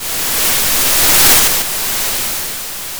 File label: Sound of waves crashing on a shore